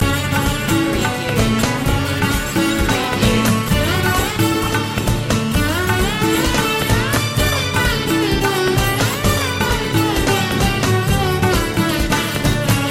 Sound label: delhi stomp loop